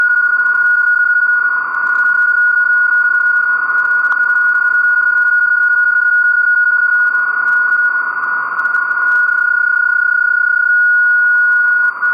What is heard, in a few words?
PSK31